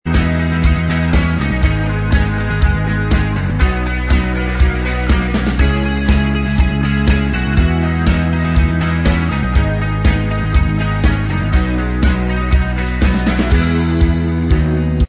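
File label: Instrumental Track, backing tracks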